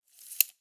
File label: side menu slide out, ui; Counter Strike Model Pack; CS:GO; panorama